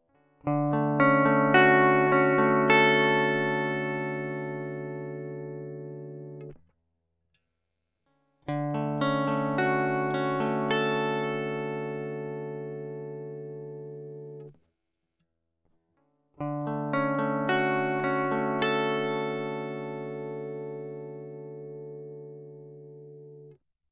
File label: arpeggio